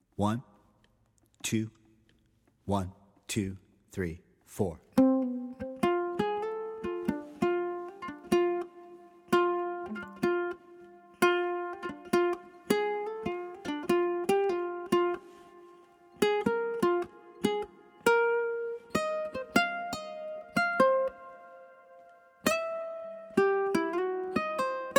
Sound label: uke